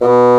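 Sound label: Bassoon B0; Digital Sound Factory Virtuoso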